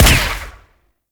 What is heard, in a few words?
Pistol Silenced Shot; Operators; Drifter